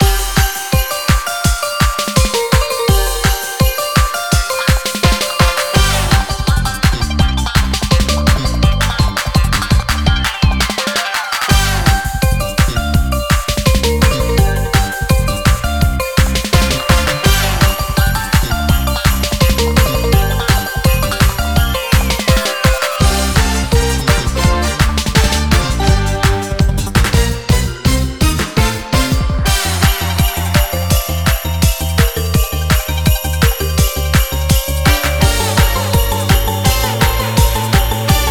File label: Music Antarctic Main Loop; boom karts multiplayer kart racing android